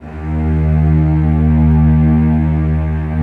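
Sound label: celli sustain d#2, Symphonic Orchestra; Sonatina Symphonic Orchestra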